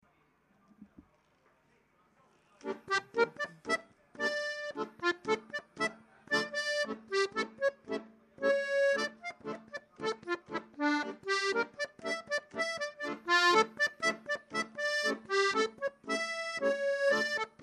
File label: tango; karen arsfest